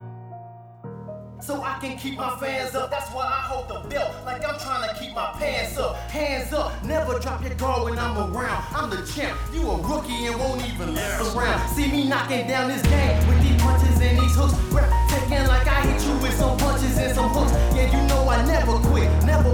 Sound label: Hip Hop; 16 bit mix